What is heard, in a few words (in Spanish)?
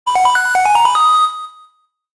llamada; Pokemon Essentials; Pokemon Iberia V1.03